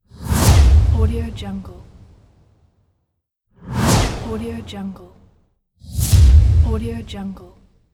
fastmoving dynamic whoosh

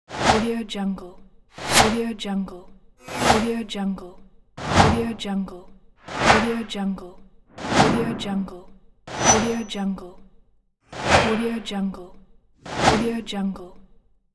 whoosh

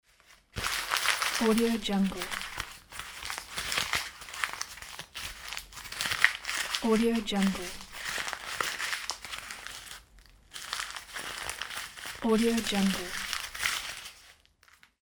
rustle crumple paper

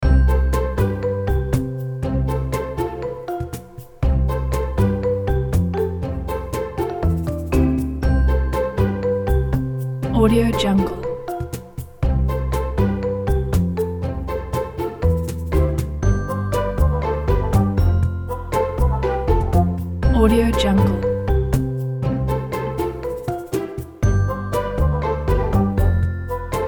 joyful quest

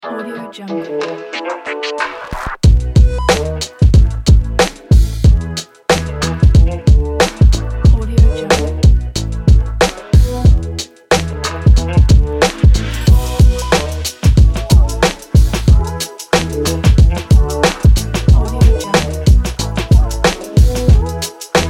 children boom bap

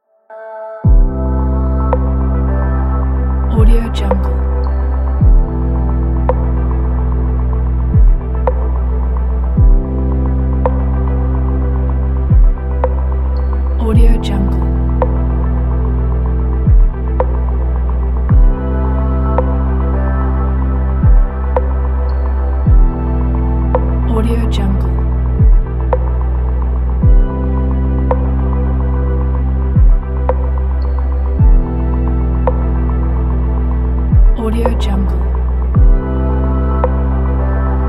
chill electronic ambient

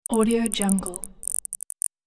static electricity sound

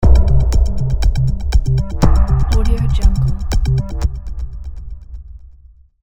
hitech logo

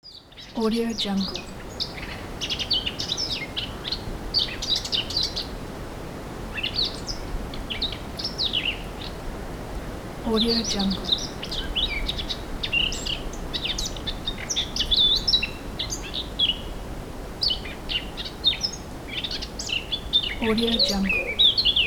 forest birds

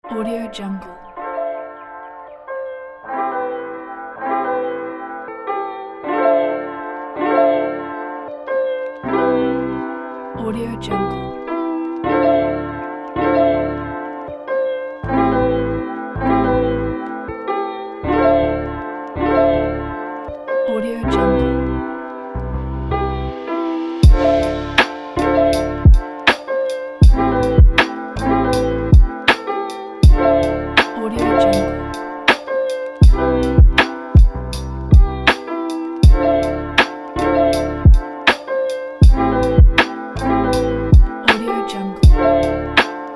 lofi; colourfulsounds

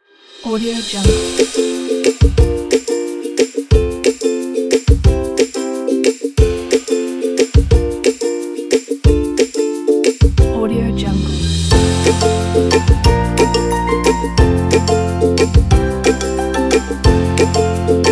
spring and joy

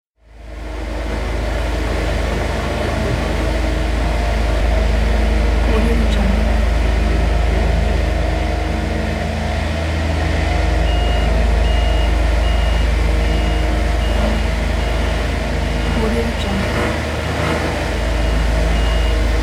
asphalt paving machine; soundfoodsfx

